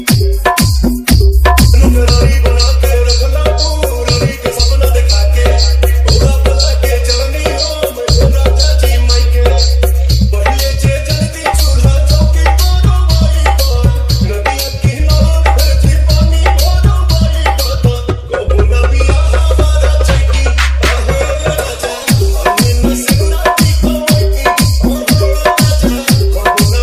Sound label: Hard Vibration Bass Mix